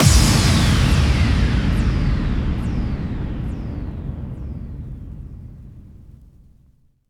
Bassdrums FX, FX Kicks; Vengeance Samples

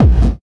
Bassdrums Dirty, Kicks; Vengeance Samples

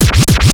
Vinyl FX and Scratches, FX Scratch

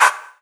Percussive FX; Percussion; Vengeance Samples

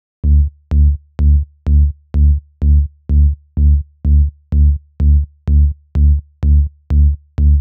126BPM Bass Root D, Tech House; Connectors; Vengeance Samples; Bonus TechHouse Kits

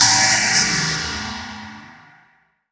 FX Downlifter

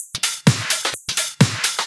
House loops 128BPM; Vengeance Samples

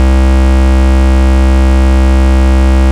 Ultimate Bass Root C; Vengeance Samples

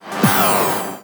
Tonal FX G

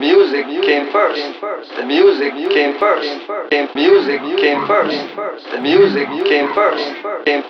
House Loops, Vocal Loops House 128BPM

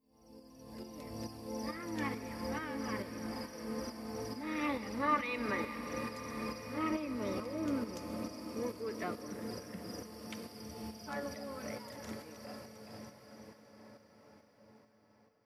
Universe Kit 137BPM Ethnic, D minor; Sound FX; Vengeance Samples

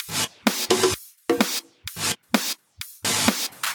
Minimal Loops

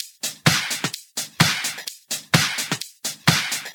House Loop, 128 BPM; Vengeance Samples

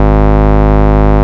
Bass, Root G#; Vengeance Samples

